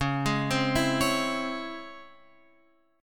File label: Db Minor Major 7th Db down Guitar Open F; DbmM7 arpeggiated; JGuitar